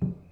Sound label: bounce